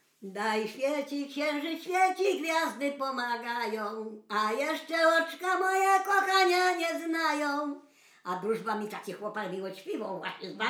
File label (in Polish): przyśpiewki